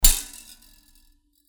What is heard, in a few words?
Sword Sound; FXHome; Fighting Sounds